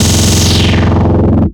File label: filter roll; Zero-G; Total Drum Bass; Hits and Bits